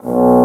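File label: Bassoon B0; Digital Sound Factory Virtuoso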